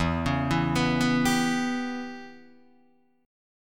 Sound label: E Minor 6th guitar chord, Open D